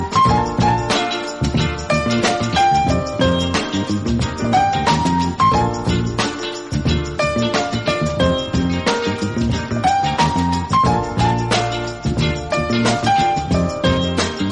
piano jazz; cstrike; mvp

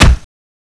metal hits; cstrike

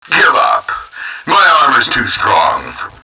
boss hurt

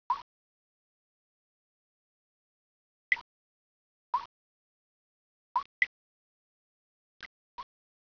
drip amb; cstrike

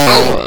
buzzer hit; cstrike

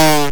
buzzer miss; cstrike

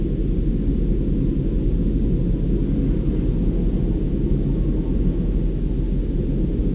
battleship; valve

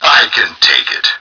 boss hurt

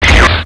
beam blast

